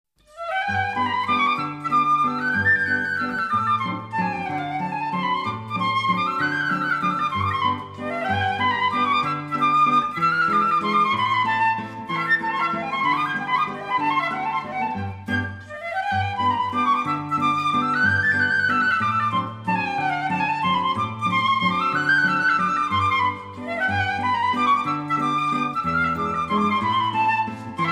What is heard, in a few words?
mazurka